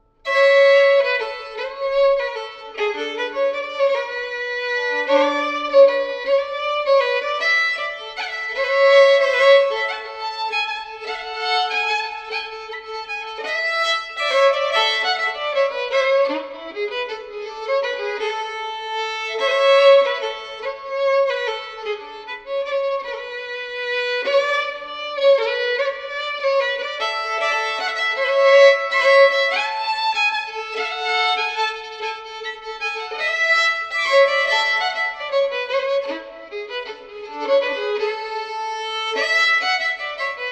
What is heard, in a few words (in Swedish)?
Hjulbensvalsen